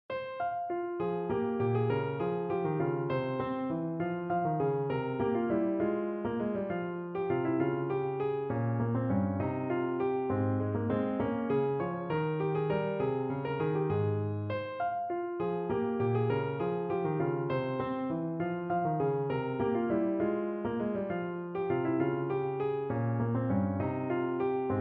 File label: Gigue in F minor